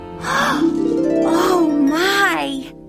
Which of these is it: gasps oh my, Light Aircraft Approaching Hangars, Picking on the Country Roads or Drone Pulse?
gasps oh my